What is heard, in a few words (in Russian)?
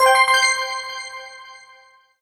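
звонкое уведомление об смс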